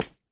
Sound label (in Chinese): 蜡烛踩踏声